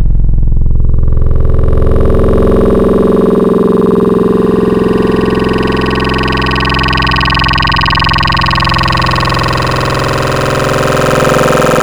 Vintage Talking; Synthetic's Serum Collection; Cymatics - Nightmares Vol 2 Wavetables

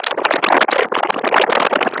Plastic Crumbles; Chill Trap 2 Noises

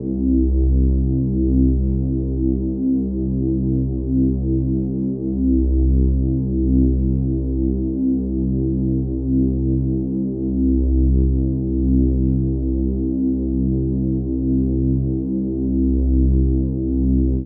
Camera, Noises; HYPERBITS

